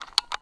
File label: stingray cock, guns; stingray cock raw edited